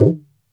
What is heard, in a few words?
gumki, mrid